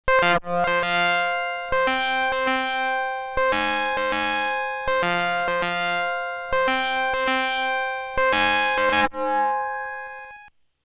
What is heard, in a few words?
piano dist, HardSID